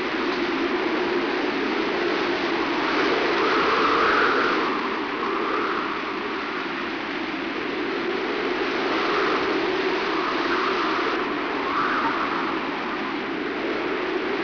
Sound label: wind tornado; Pirates Of The Caribbean